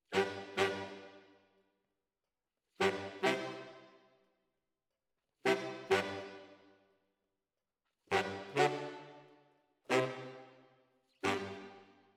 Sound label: ORTF SCHOEPS, piano